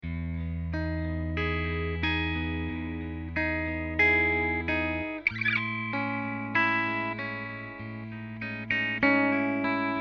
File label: Jazzmaster pickups